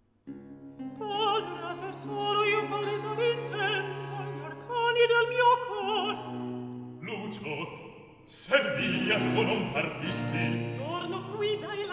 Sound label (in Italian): Recitativo; Atto 2 - Scena 2; Vitellia, Tito, Lucio, Servilia; Padre, a te solo io palesar intendo; Antonio Vivaldi; Tito Manlio (2005)